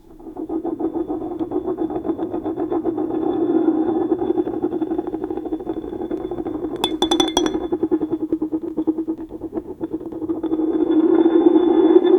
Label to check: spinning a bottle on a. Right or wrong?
Right